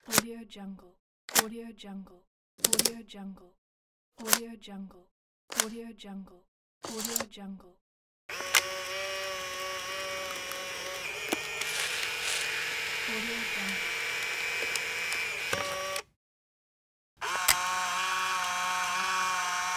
instant camera pack

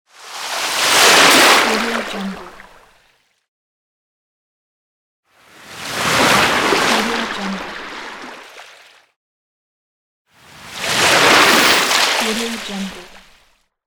water wave splash; floraphonic

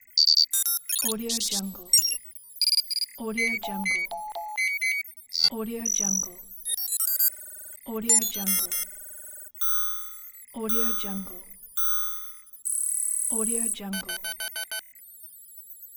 cybernetic device

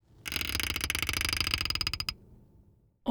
plastic ratchet truck blinder

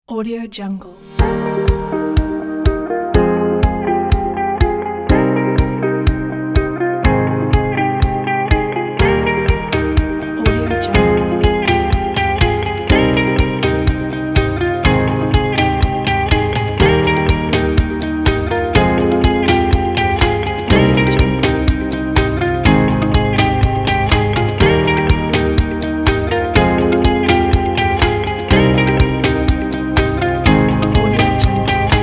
corporate uplifting inspiring motivational; dailywaves

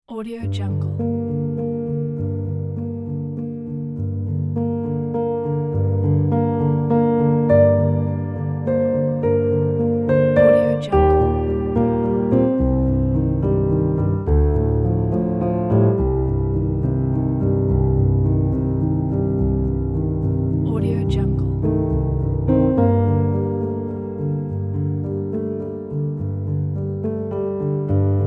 emotional sad epic piano

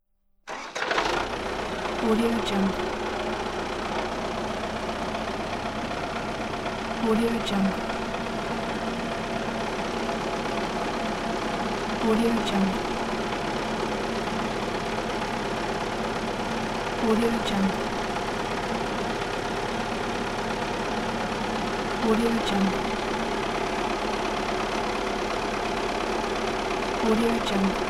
tractor starting idling revving and pulling out

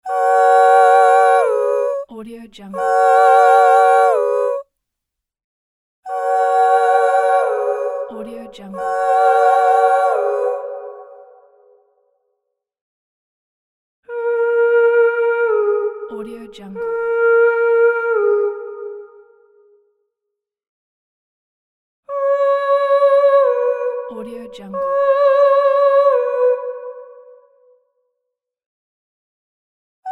heavens gates choir; floraphonic

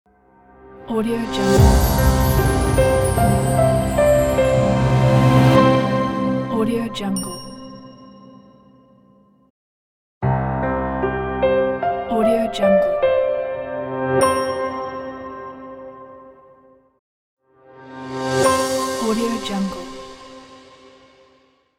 logos

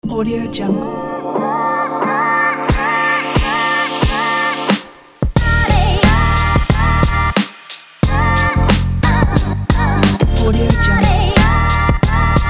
hiphop

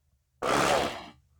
robot scream processed short screams moans

